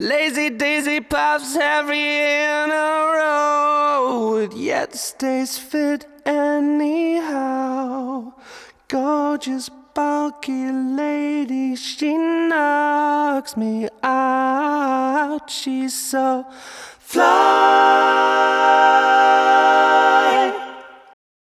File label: Vocal Soft Ratio; VSC-3; 01ms Attack; Auto Fast Release; 4 dB GR; RMS